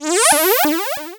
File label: Cry